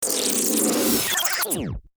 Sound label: speedy reverse chatter bot